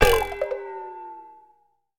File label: gadget build fail